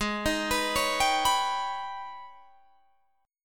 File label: G sharp Minor Major 11th, Guitar Drop D